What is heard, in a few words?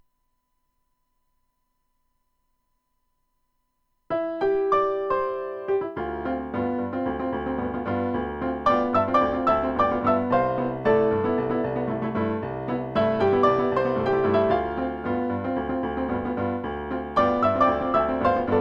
piano; zongora